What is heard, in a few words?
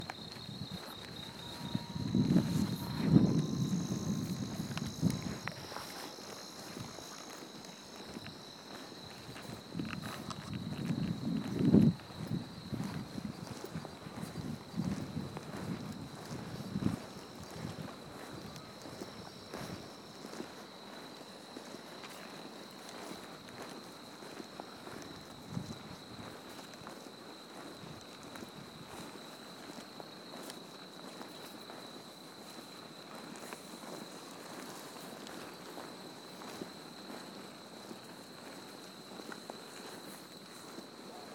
Hong Kong Mui Wo Village insects birds etc